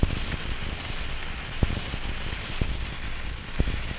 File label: Fear Loop